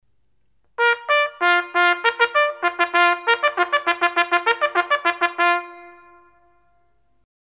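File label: signals - combat alarm, gorn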